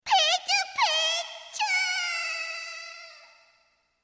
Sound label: screaming; pichu